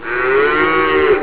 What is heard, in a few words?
moo